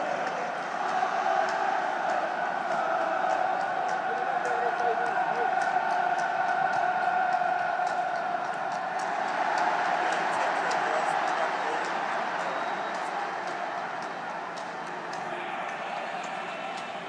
pretty good noise from the Millwall fans and i m outside the stadium